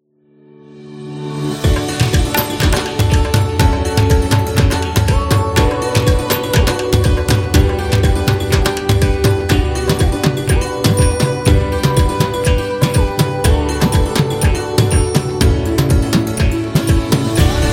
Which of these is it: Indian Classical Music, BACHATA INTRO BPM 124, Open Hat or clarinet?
Indian Classical Music